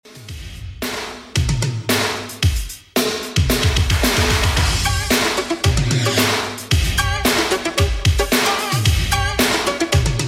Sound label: 80s Redrum 112 bpm